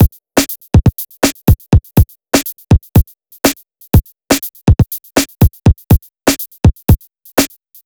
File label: BEAT, Hip-Hop & R&B; USB Soundscan vol.47; AKAI